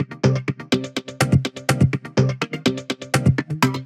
124 Cm, Melodic Loops; M8; Electronisounds

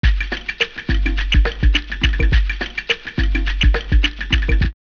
Gritty Groove 104, drumloops OldSchool; X-Static Goldmine

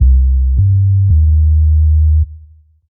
Subline Bass; Sonic Foundry (Sony Creative Software); Knutrix Drum & Bass

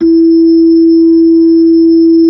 B-3, Hollow; Roland LCDP10 Keys of the 60s and 70s 2; Hollow Xfade

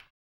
HAMBONE, Vocal Blues Soul; Spectrasonics; Planet

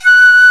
PICCOLO F#2; E-MU Formula 4000 Series Vol. 5; Protozoa; Proteus2 Presets

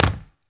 STAM, Human Perc, Body Perc

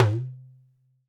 percussion, Roland TD-20

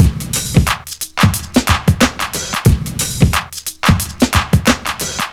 claphead

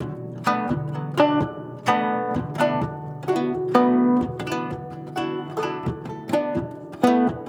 Guzheng Poly-Rhythm; Asia; Continental Drift Aftershocks

